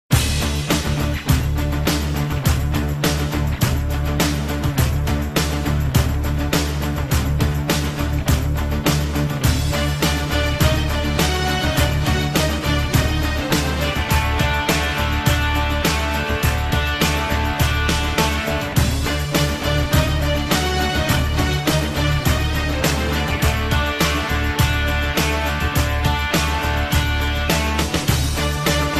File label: Enstrumental